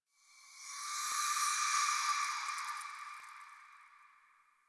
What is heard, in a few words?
Wind Spine Grass Scream Gust; Ambience; Misc Outdoor; Warframe; Infested Eris